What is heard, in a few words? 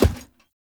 Heavy Grunt Boot Walk Wood; Characters; Enemy; Footsteps; Sinecure